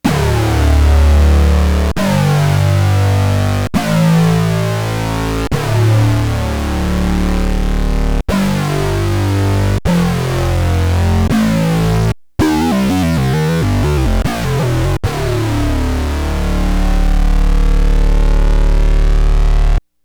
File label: Prophet 5 snarly